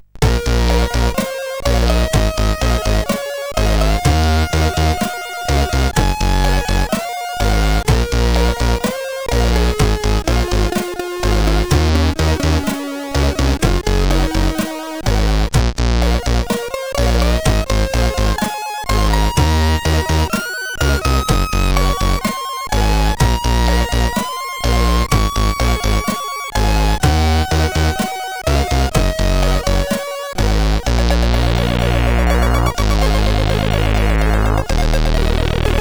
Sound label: Rythm Crush, CSG8580R5